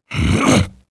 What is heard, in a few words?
vce effort small